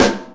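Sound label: snare drum hit, roots reggae; Sounds Good - Roots Reggae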